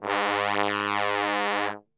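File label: G#1 bass trombone, Brass; Best Service; Brass Super Section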